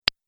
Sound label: Idea Single Pop, cartoon; Cartoon Sound Design Sound Effects